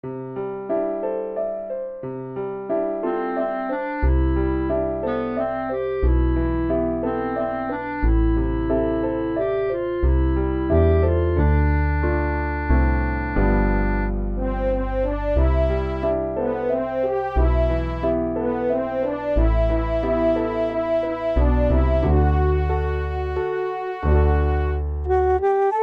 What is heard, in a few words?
relaxing